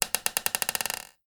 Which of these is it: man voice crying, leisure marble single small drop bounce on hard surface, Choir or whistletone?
leisure marble single small drop bounce on hard surface